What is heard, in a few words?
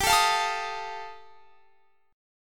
G 7th Suspended 2nd Sharp 5th G chord